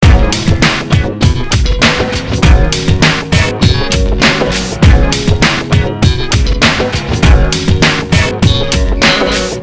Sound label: depressing rock music